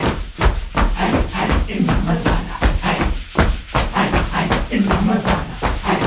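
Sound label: 80 bpm, group chants; Spectrasonics; Supreme Beats; African Contemporary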